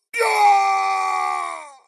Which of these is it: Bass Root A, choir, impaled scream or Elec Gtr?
impaled scream